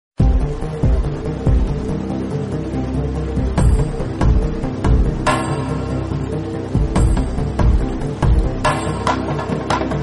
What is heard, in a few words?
violin bgm; majili